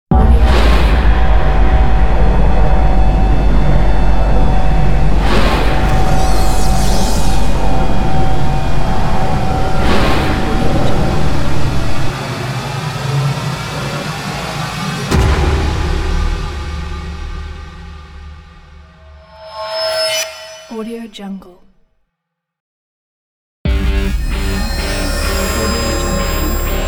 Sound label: horror riser logo pack